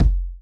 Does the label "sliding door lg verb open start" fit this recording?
No